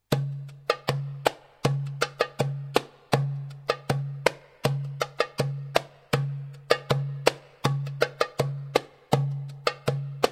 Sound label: darbuka; notations